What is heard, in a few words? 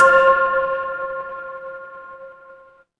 instrument singing bowl